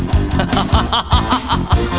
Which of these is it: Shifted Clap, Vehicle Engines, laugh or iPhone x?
laugh